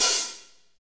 hat, Power Ballad; Northstar; Drumscapes Roland

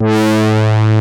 D3 BRASSY; East West; Global Sounds

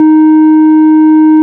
ORG, Farfisa; Roland LCDP09 Keys of the 60s and 70s 1; Farflt DBars

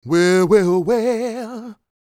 SING, Vocal Planet R&B & Dance; ILIO

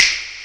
snaper C5; SNAPERS

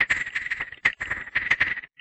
Isotope Beat, Electronic Beats; Sonic Foundry (Sony Creative Software); Myths of Technology

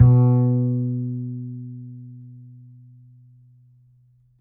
A, upright acoustic bass, A string

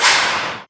Torque Wrench, Alesis HR16B drum machine; 300 Drum Machines

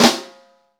snare, Roland

